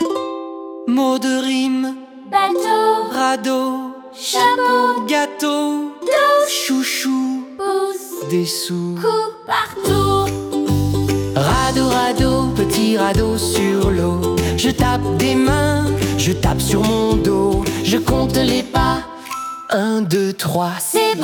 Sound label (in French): comptine rythmique, radeau sur l'eau